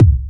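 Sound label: bass drum, kick; 300 Drum Machines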